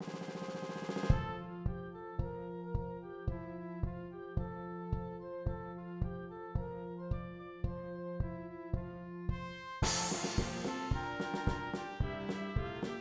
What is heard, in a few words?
perc